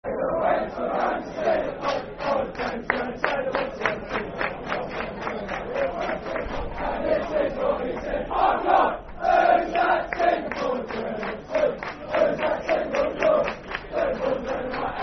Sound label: Football Chant (Soccer Song); Whose That Team We Call The Brentford; Brentford Bfc